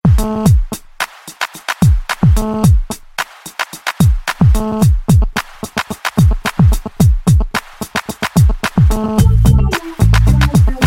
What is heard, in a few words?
radiation ringtone